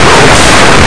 book

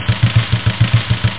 drum roll

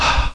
Book Open

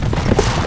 horse

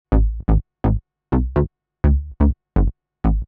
SNTH BASS HOUSE 125 A; eJay

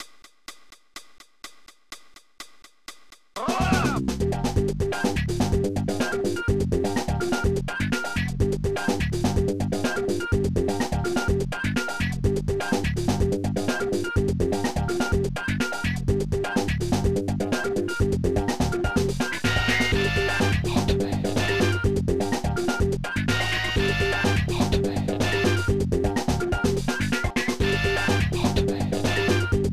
funk; octamed

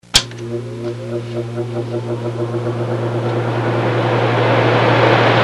air cond, machine motor

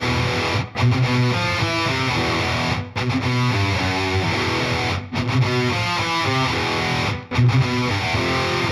Guit; Metal Zone 12

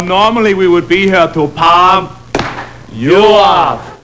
pump up; Multimedia Toolkit

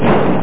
crash; SkidMarks v1.06

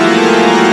Organ beep

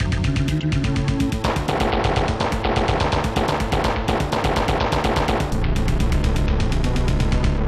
wings; AmigaElysianArchive

